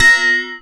A#, Synclav FM PERCUSSION; Big Fish Audio

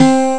tavern piano; Amiga Soundtracker Sample Packs

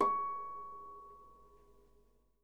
H C#6, Percussion & Harp; Miroslav Vitous

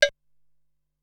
Cowbell, Ensoniq SQ-R Plus

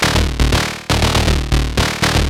SH101 LOOPS; ELEC-LOOPS; Syntec; Wall of Sounds; TECHNO-BOX

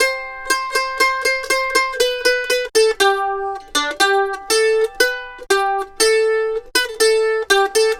Mandolin Lead; Appalachian; Continental Drift Aftershocks

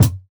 Kick, jungle breaks drum sounds; ORIGINAL JUNGLE BREAKS; JBK KICKS

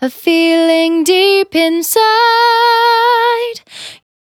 Finally 70bpm C, Velvet Vocal; Zero-G

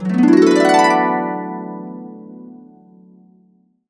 D, Harps; Complete Harps; USB Soundscan vol.62; AKAI

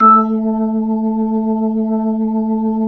B-3, Jazz

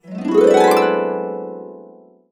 E, Harps; USB Soundscan vol.62; Complete Harps; AKAI

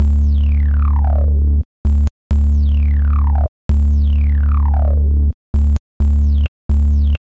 Mutation Bass; Zero-G Smoke

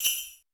Roland U220 Dry Set

